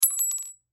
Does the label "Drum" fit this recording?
No